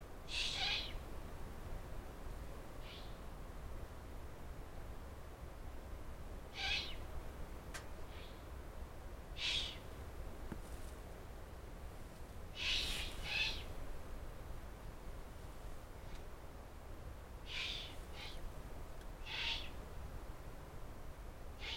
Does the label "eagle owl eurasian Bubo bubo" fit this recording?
Yes